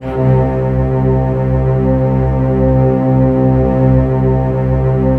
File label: DOUBLE BASS; ILIO; Kirk Hunter Virtuoso Strings VOL-2; 90 s Sample CDs; 5DOUBLEBASS